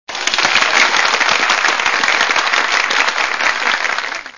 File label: applause